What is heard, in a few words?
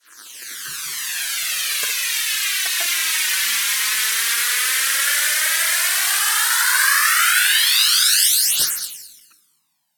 Fizz Phased